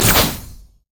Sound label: spiritrush; LoL; ahri